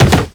Operator Land Soft Wood, Footsteps; Characters

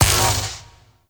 Vial Ability Magnetic Shatter; Warframe; Warframes; Alchemist; Elements

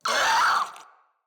Infested Crawler Pain, enemies; Crawlers

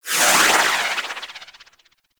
Void Angel Corruption Healing Proj; Abilities; Warframe; Zariman

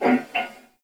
Wolf Breath; Animals; Duviri

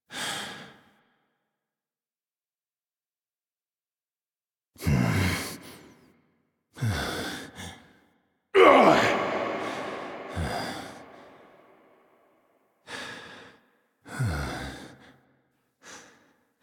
We All Fall Down Alt Breaths; Arthur; Warframe; NineteenNinetyNine; Stage12PartyOfALifetime

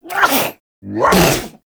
Pet Sneeze; Enemies; Warframe; Catbrow; PetShip

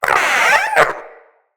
Wyrm Idle, Pets, Vocals

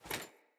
Foley Action Default Sword Throw Windup

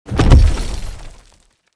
Corrupted Stunned Sit Impact; Bromius; Foley

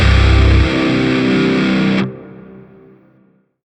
Shawzin Min Chord D; Music; Warframe; Grineer; Chords

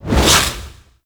Double Jump; Warframe; Wraith

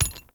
Flak Cannon Shell Impact; Warframe; Weapons; UT Weapons

